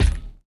kick; gamestop; nova sound

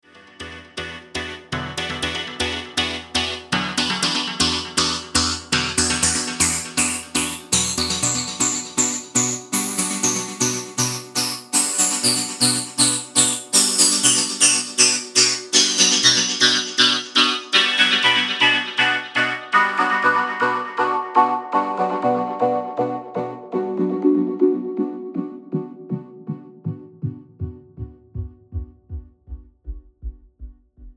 phased out

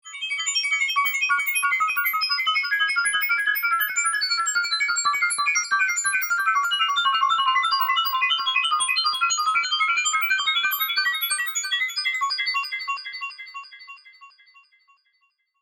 electric drizzle

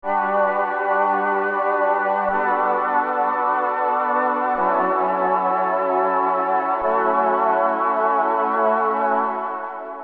serum pads; chiqui audio

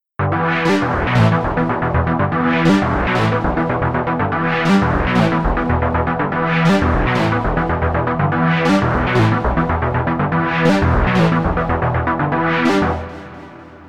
16 steps rhythm

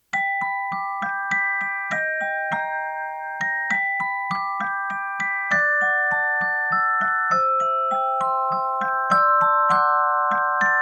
celestette tape

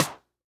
flare snare

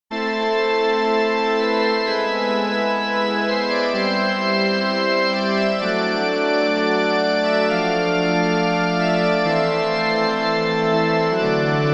organ; stringer moongirl

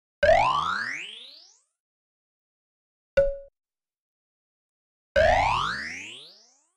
H910 harmonizer; Eventide

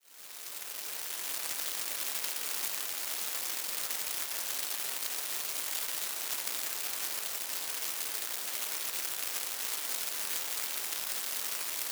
pelting rain

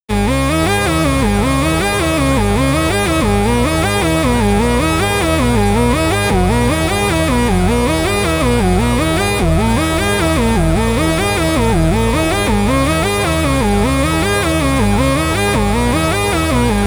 synth lead inflator

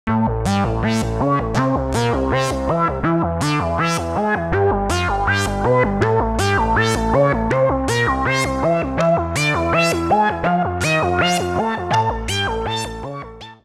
comb step sequencer

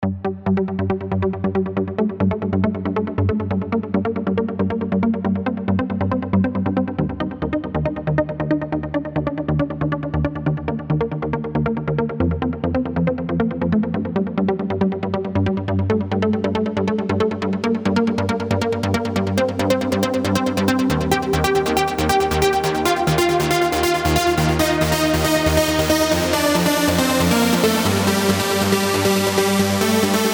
trance lead

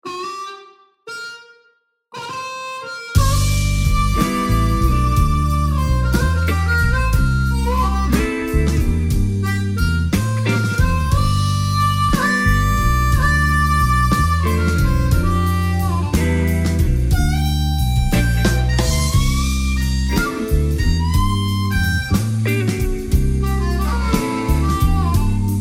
honkytonk harmonica

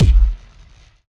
blown transformer kick

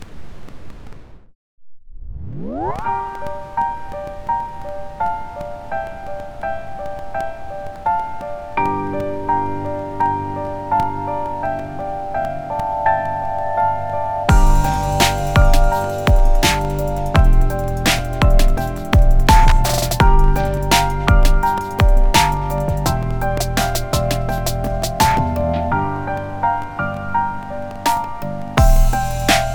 boom bap

